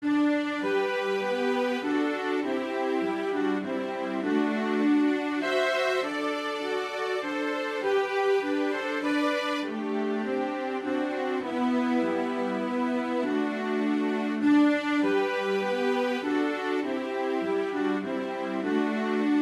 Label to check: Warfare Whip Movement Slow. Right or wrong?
Wrong